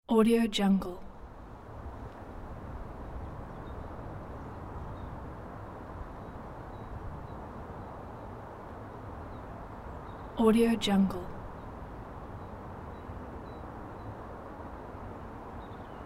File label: distance city day dry leaves birds